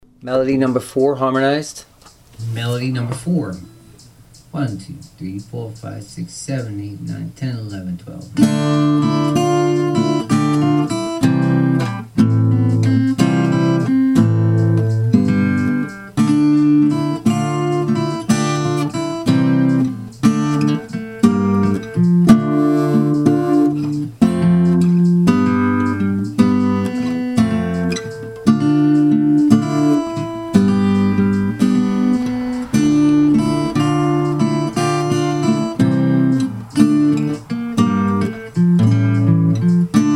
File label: melody harmonized